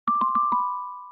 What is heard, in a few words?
bell sound